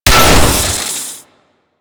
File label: Pipe Blow; Warframe; Grineer Hammer Boss; Pipes